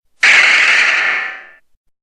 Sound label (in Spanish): Grito de Mega-Banette